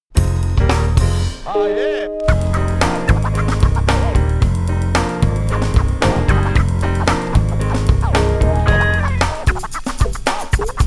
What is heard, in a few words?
Acid Jazz